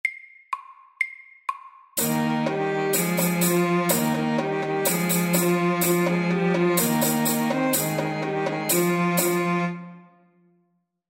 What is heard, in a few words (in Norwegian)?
Folkedans FAST 125